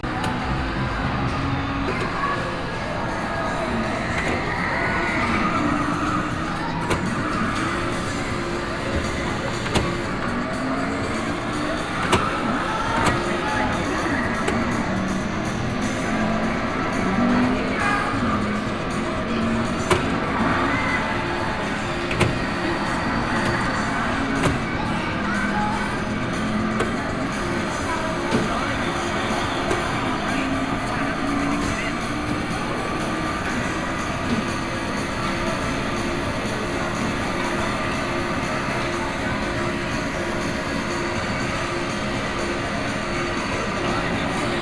Draussen vor der Tuer; Fieldrecordings; Brasilien

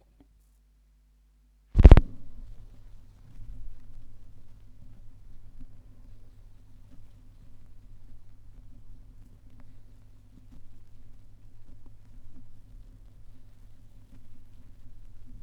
schallplattengeraeusche, Vinyl

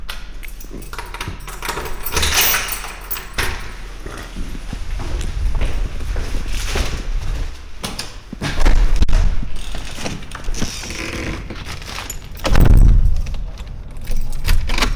Auf Dem Weg; Fieldrecordings; Cafe in Lissabon

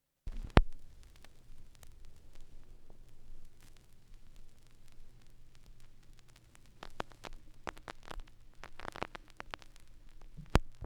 schallplattengeraeusche

